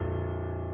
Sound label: key